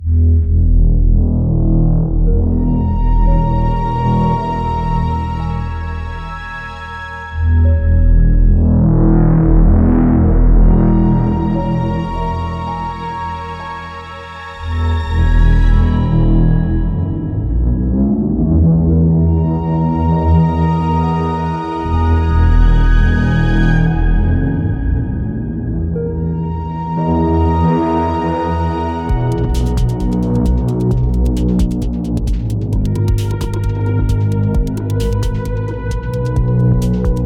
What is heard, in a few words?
space dance